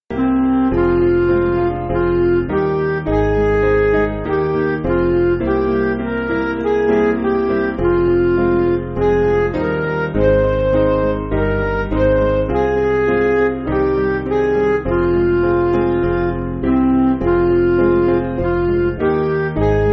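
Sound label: Midi, Music Backing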